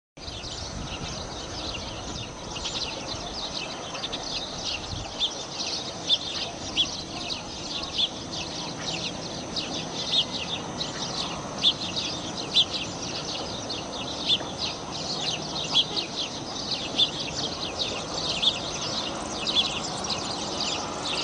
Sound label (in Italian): passera d italia